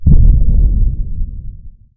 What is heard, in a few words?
chain frost impact; weapons; dota; lich